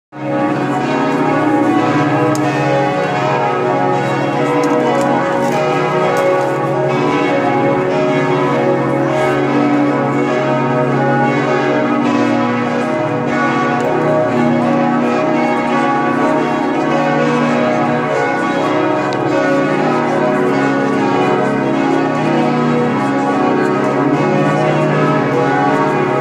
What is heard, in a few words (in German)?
Glocken